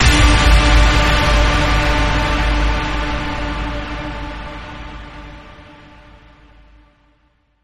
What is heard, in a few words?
Ping Sparks Fly, D